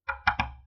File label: wood door light knock outside 3 times